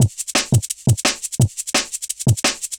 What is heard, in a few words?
Scissorhands Loop, Drum Loops Double Time; Zero-G Smoke